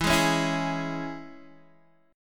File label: E 6th, Guitar, DADGAD